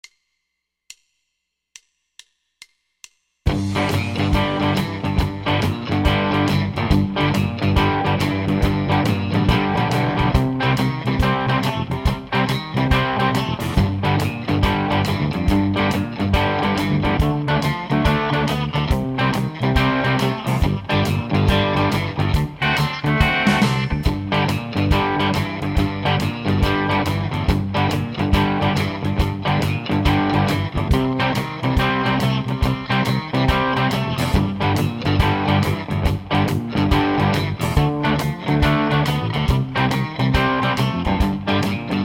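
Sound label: Shuffle Boogie 140, harmonica; Быстрый минус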